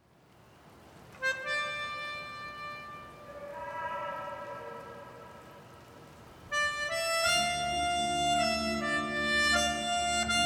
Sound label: chill; Моя первая проба записать игру на клавишно-духовом инструменте — пианика